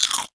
worm eating fruit; 摇钱树

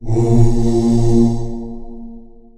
choral; 祖玛

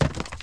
wall; 帝国时代+罗马复兴

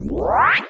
upgrade; 摇钱树

